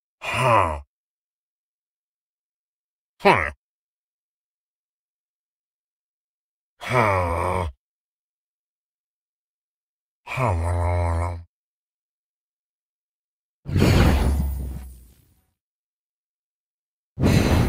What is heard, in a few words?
Minecraft Evoker; ایووکر ماینکرافت